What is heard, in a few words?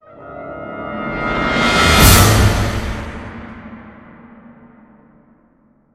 BRASS SWELL DRUM HIT; gamerip; THE DECAGON